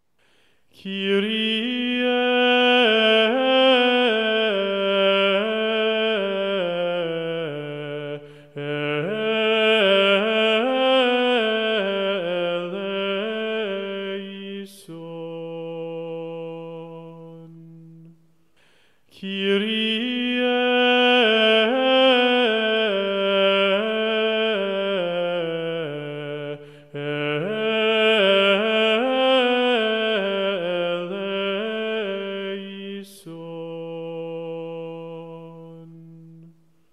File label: Mass ii Kyrie; choir schola